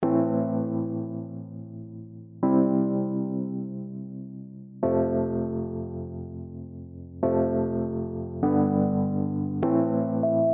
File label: audio electro before